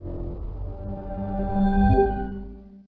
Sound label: Startup; Windows Never Released Soundpack 1; deviantart